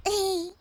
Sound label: hee; rika furude (og higurashi)